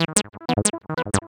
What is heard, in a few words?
Heavy Industry Syn, Keyboards